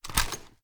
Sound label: drop